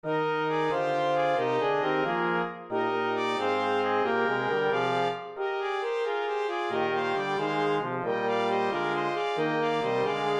Fanfares

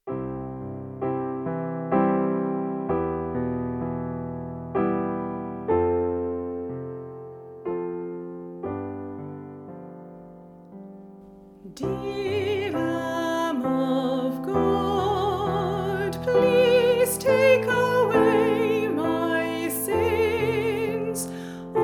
Piano and voice; Oh Redeemer

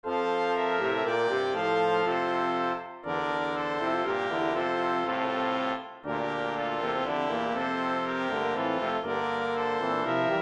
Fanfares

